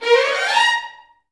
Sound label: VI RNMI A#4, Advanced Orchestra; Best Service